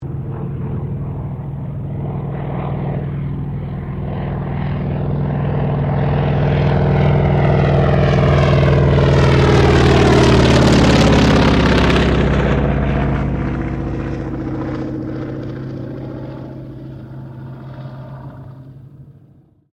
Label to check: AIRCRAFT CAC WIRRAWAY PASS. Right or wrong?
Right